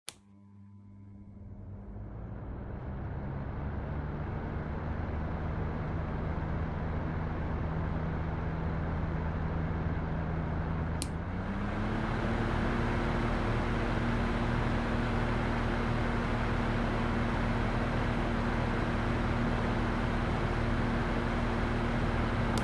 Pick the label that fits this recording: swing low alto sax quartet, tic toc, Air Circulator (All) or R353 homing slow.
Air Circulator (All)